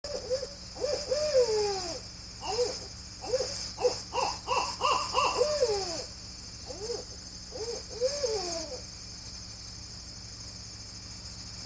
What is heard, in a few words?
owl hooting loudly; wildlife; birds; yard; owls